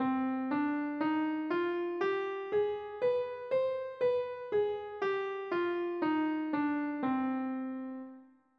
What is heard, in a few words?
C harmonic minor